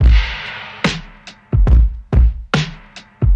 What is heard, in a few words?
returning the cymbal, drum loops boombap